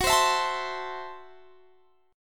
F sharp Minor 7th Flat 5th F sharp Guitar Standard; strummed; JGuitar